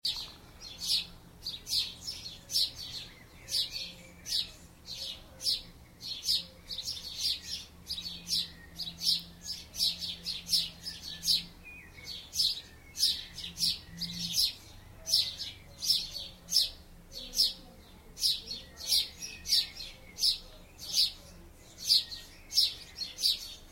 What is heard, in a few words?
sparrows